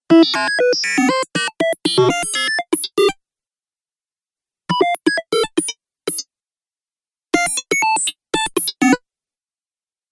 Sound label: Digital Data Sequence Collection, Beep Sound Effects